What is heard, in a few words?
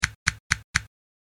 A Series Of Clicks; Andrew Fleming